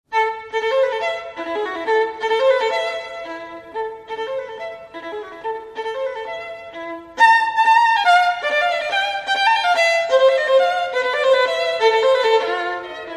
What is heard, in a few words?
classical minor ringtone